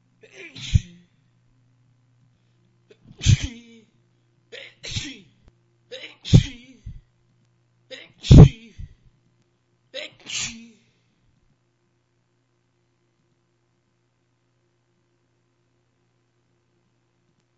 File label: sneezy, male